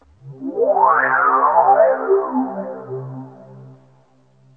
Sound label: sweep in; Pads